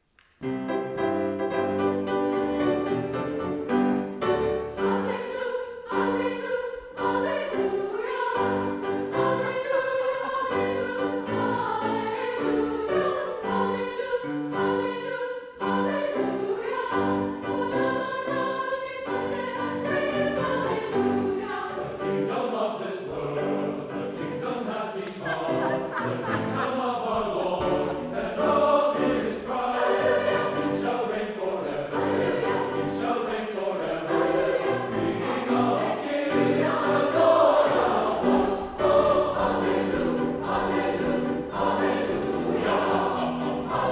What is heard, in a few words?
Jingle Bells Hallelujah